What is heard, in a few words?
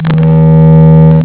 HORN, TRUCK